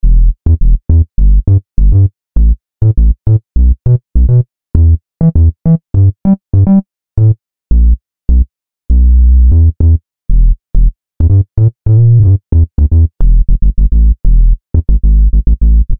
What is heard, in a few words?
Square Synth Bass; IK Multimedia; SampleTank Free